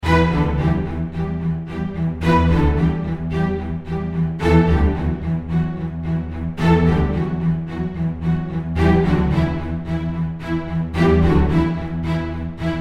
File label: Cinematic Strings in Em D Ringtone